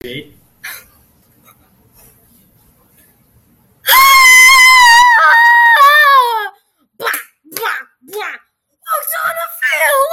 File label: teenage boys singing